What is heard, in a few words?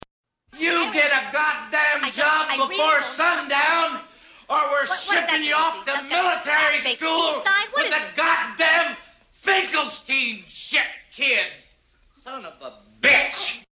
Kid